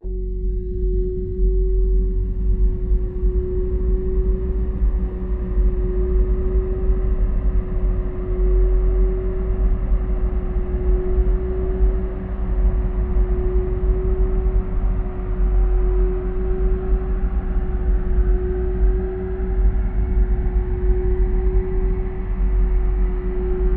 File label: dozer buildup